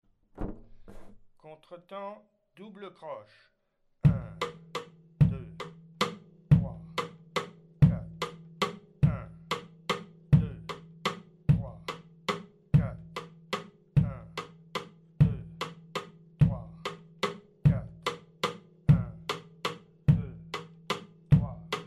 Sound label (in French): contretemps double croches